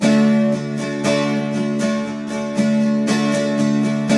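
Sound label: 12 string major D#, Guitars; Spectrasonics; Hans Zimmer Guitars VOL-1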